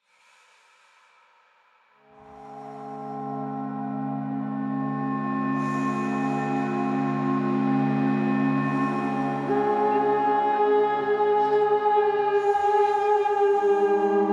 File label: Melody